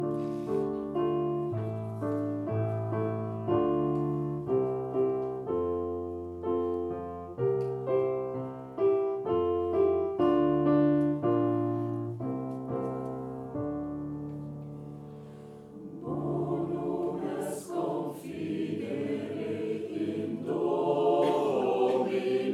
Taize

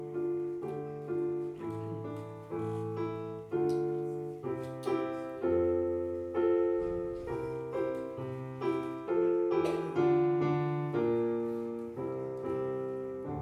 Taize

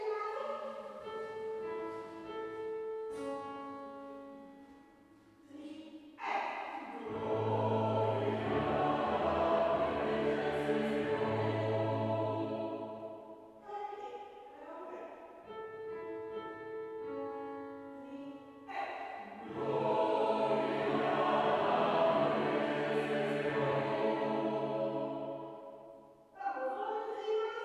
Taize Gloria Deo SATB

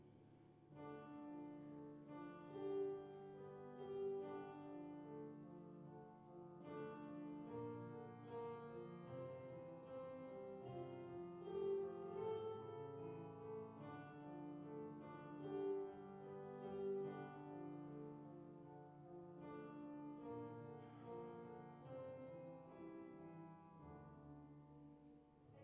Taize BAS

